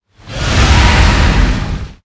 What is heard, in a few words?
Roar; Perorozilla; blue archive